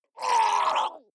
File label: water monster female gargle pain short; koerpergeraeusche; comedy-cartoon; soundpool